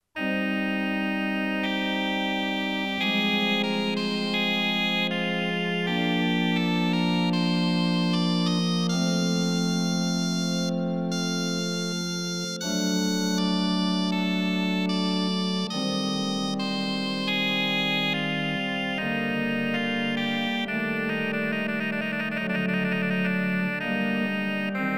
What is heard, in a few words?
Regal 8 Vibrato Rohrfl 8, organ; Doprovod